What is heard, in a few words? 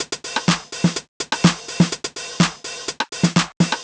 drum loop bust top 125, Classic Chicago House; Sample Magic; Drum Loops